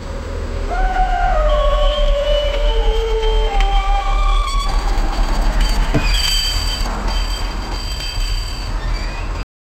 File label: loud crunch of cars and; tentacles